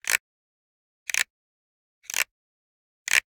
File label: Generic Click Plastic Small; boom library; The Interface